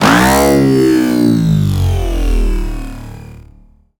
soundwave robot transformation texture; BluezoneCorp

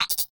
Triple Click, Game & UI Interface; L.A. Sounds

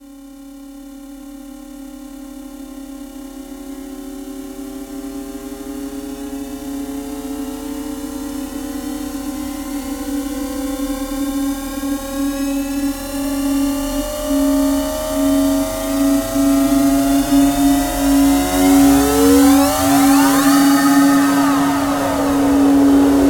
rise, Cinematic Trailer Samples; BlueZone; Invasion

